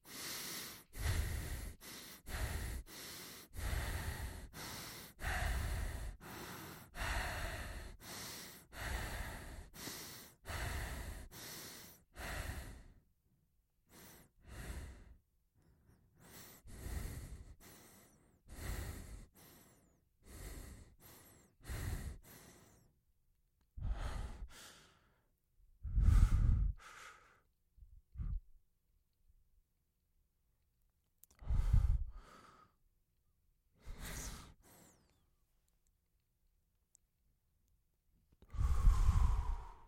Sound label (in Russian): dihanie muzhchin v razlichnom tempe